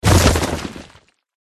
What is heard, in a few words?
golem attack contact up